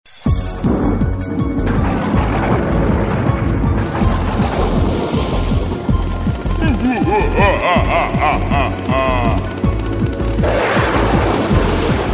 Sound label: Scary